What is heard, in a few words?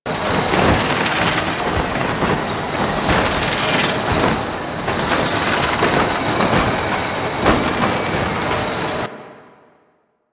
Paratrooper Balloon Loop; Enemies; Soldiers; Warframe; Lasrian